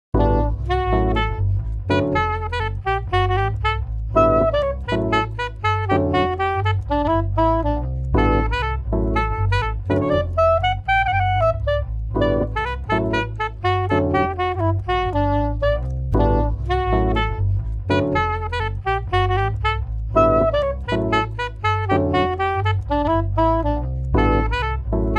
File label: Jazz; Jazz Originals